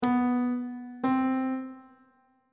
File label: d double flat 8th interval pitches; bass clef